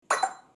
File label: telegraph